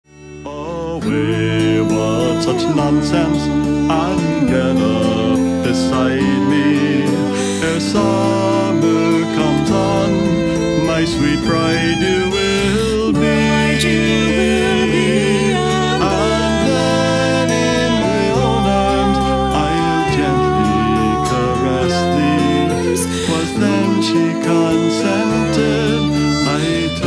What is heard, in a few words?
Lass of Glenshee, Irish Band